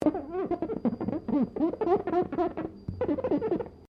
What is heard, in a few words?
GLASS SQUEAK FINGER SEQUENCE